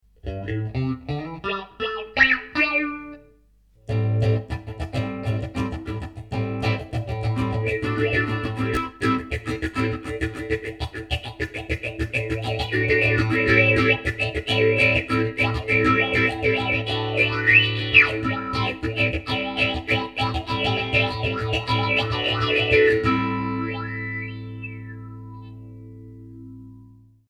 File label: phase wah; effectrode